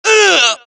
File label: death, dialogue, Allies; mohaa; Mission 3